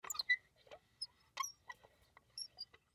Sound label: Metal Hinge Squeak; Foley; Metal Doors; Blastwave